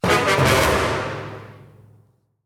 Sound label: Loss Music